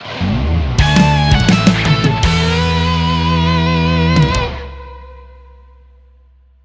BGM Rock; Summer Soda Rock Festa

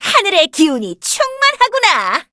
levelup; cookie run

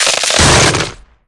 Pirate Ship Crash; Captain Ice; cookie run

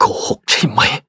hit; cookie run

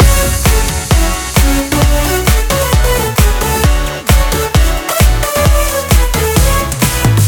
DJ PAD MUSIC AGAIN

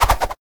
slide

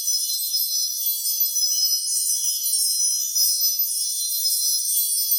skill moonlight loop; agar agar; Cookie Run

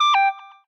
result fail

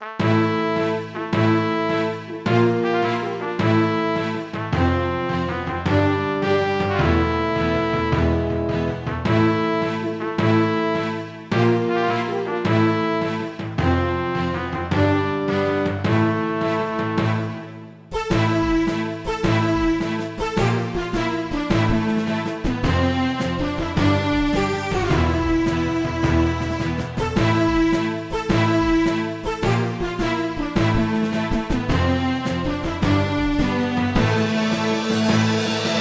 Bgm map; Cookie Run; ovenbreak

